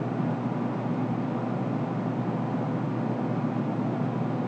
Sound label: industrial loop, ambient tones